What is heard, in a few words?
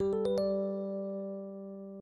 Unintrusive Startup; openmoko sound theme standard